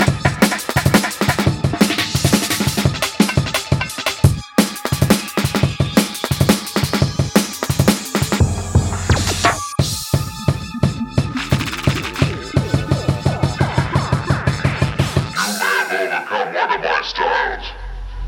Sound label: Drum